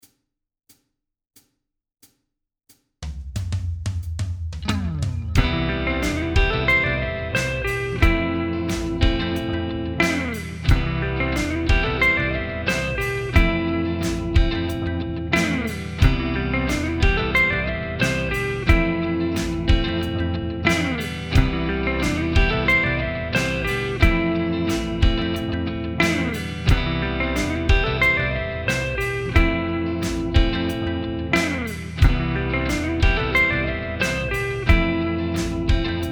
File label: C Maj CAGED Arpeggio